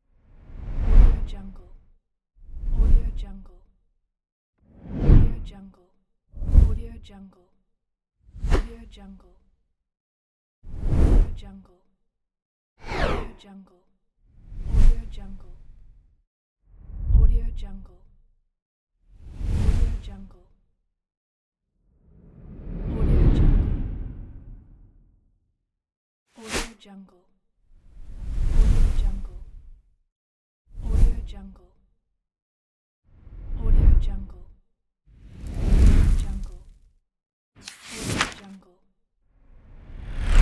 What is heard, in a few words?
whooshes pack